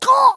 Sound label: crash; Tom